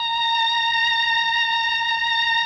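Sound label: MELLOSTR, Mellotron Violins; Sonic Reality; Vintage Timetraveler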